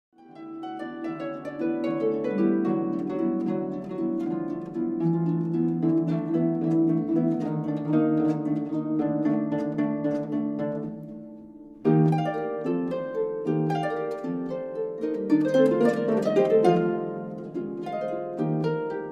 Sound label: classical harp; Fire Dance